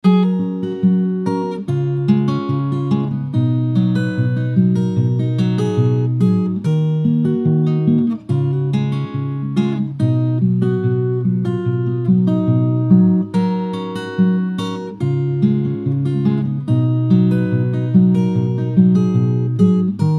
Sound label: acoustic